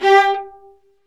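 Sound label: Marcato, Strings; Vlas Marcato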